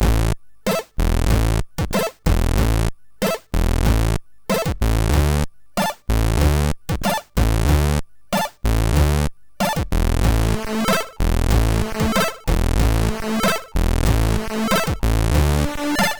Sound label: Intro Tune, MOS6581R3